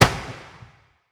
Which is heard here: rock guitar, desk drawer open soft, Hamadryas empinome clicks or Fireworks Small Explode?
Fireworks Small Explode